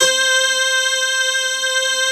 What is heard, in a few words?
REPLUG C5, SYNTH; Q Up Arts; Dream Experience